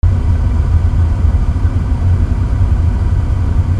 INDUSTRY

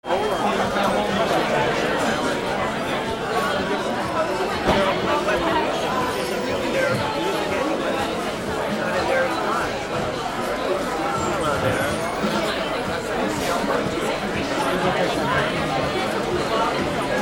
AMBIENCE

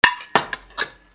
KITCHEN

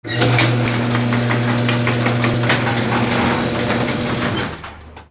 GARAGE

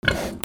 ANIMALS

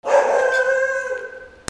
DOGS

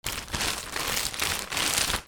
PAPER

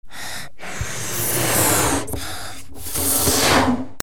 TOYS

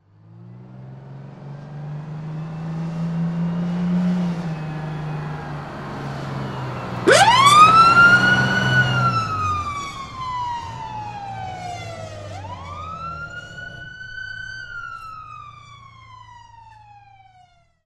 VEHICLES

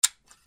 HOUSE

